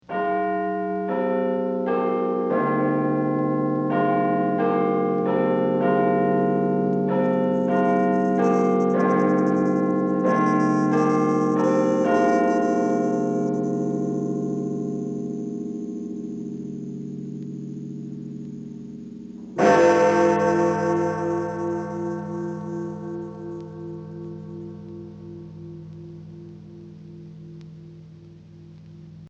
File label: Tower Bell One OClock; Bells; Musical